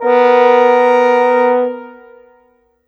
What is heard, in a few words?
Horns, Cinematic; Cinematic 07; Orchestral Series